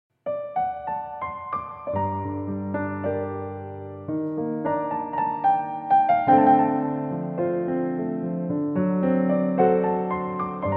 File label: Piano